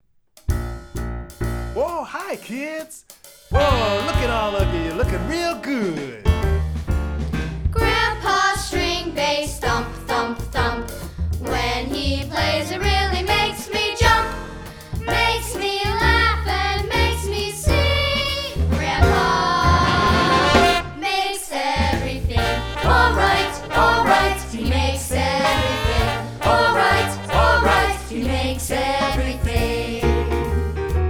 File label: Stringbass